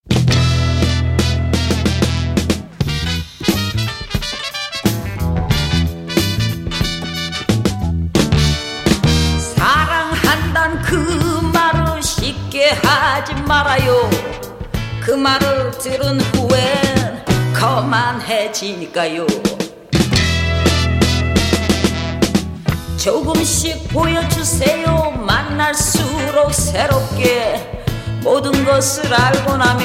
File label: funky Korean soul